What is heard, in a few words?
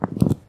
footsteps mat walk